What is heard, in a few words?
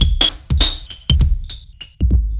drloop, drumloops, Vinyl Attack; Masterbits